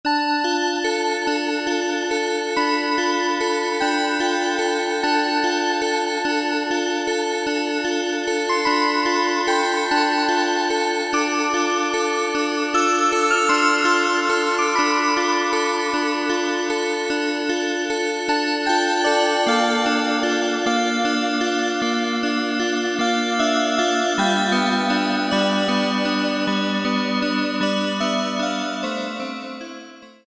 Ensoniq ESQ-1 WAVBEL

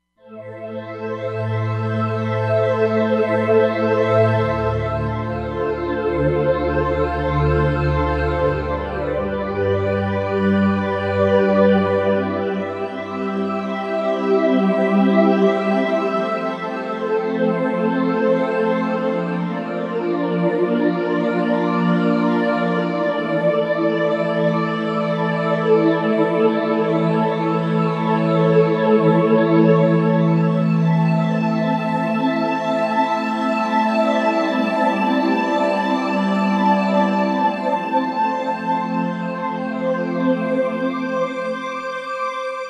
Flange Dream, Roland Fantom XR

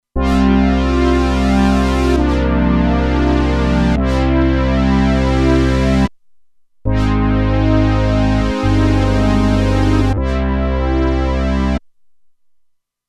Brass Swell, Roland Alpha Juno-2; Original Sound Chart patches

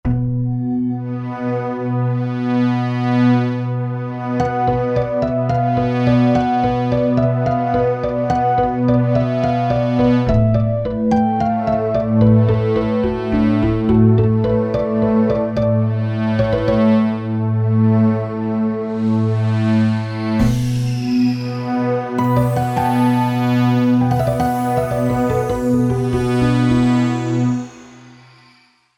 Softwaves

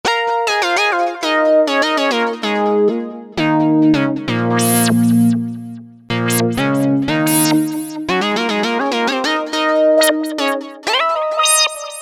Interval

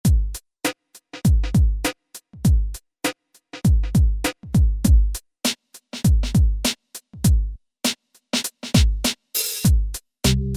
SWING MIX, Grooves; Generalmusic Equinox

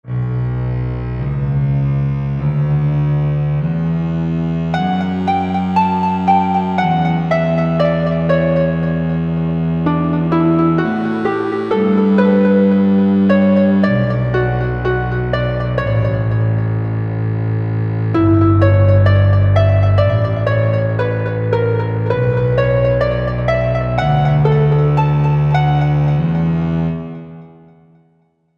Cello Harp, Korg MSC-1S; Korg MSC-1S Variety

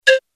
PCM Steam, Roland D-50; Waveform demos

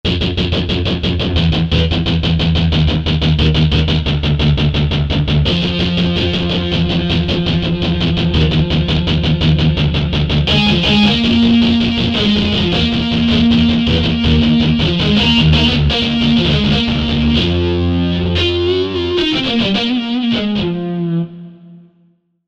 Mute DGtr

